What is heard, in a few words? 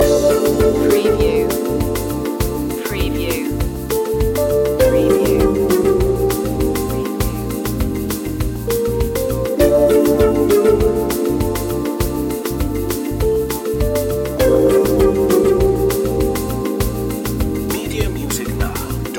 bossa girl loop